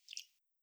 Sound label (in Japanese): しぶき 短, ぴゅっ 短め